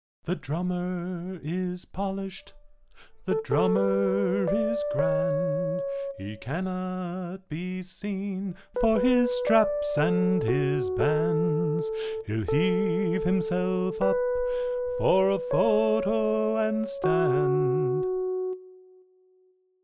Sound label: Soprano